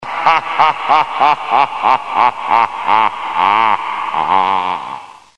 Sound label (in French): rire